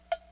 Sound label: menu popup; Egypt; pharoah